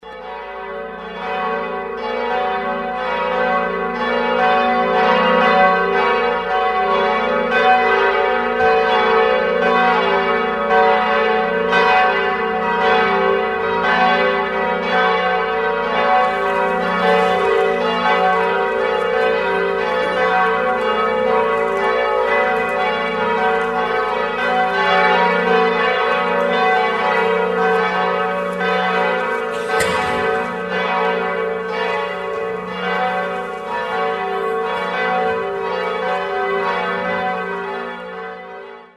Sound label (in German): Glocken